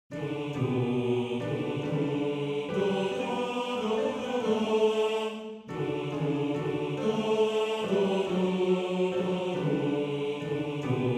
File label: Bass 1, maennerchor; Schifferlied